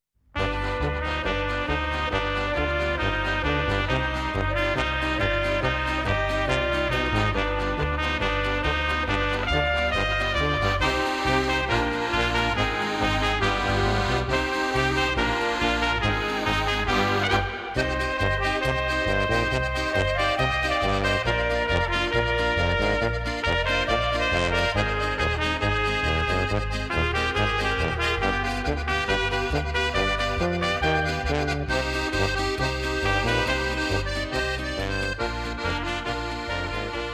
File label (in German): Misch Masch Polka